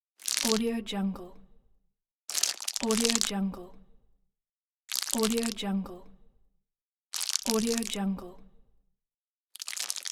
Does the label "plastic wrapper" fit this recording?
Yes